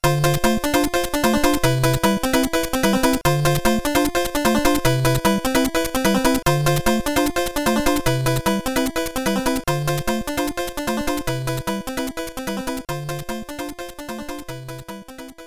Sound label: BGM Muteki; Super Mario Maker